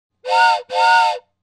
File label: Train Whistle; Soundzabound Volume 3 Disc 3